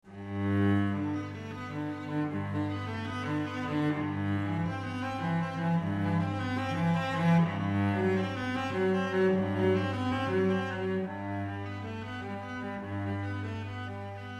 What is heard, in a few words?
Strings; soundscape08